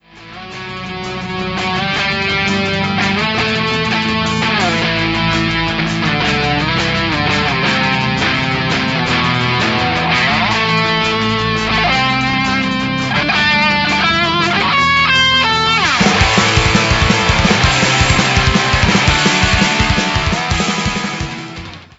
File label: song